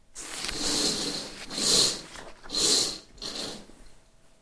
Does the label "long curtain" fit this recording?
Yes